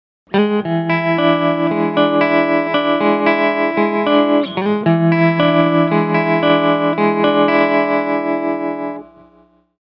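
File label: 60s tube, Strymon Flint, Guitars; Swamp Child.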